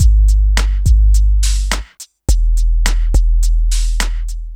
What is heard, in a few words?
bass heavy, drum loops, electro